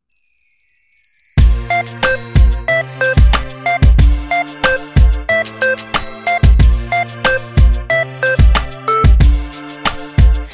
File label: Love sms